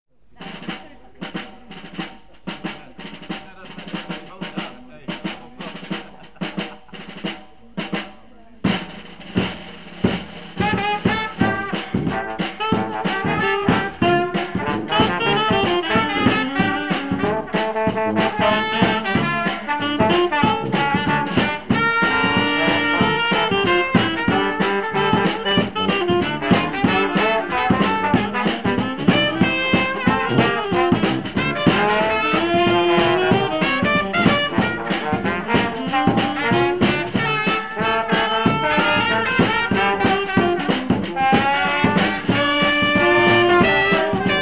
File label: Brass Band